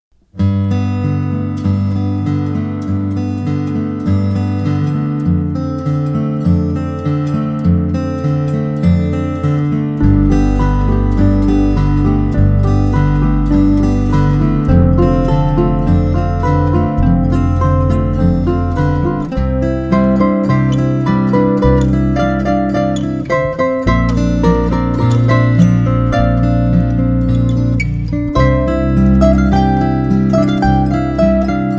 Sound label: lullabye